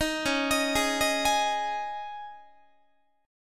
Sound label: Db Suspended 2nd Guitar 4A,4E,5A,5D,5G sharp,6C sharp; arpeggiated; Dbsus2; JGuitar